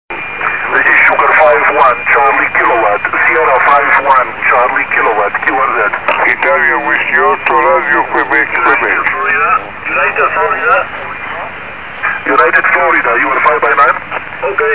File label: helikal